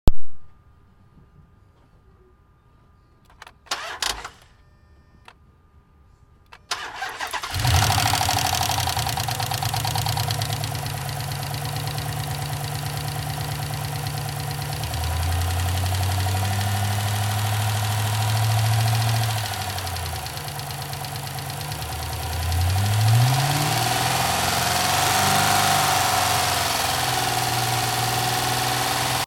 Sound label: 2006 dodge charger